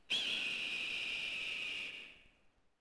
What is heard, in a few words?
Hawk Far; Ambient; Civ4 warlords